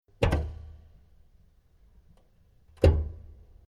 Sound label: Industrial Mechanical Big Electrical Lever Switch Off On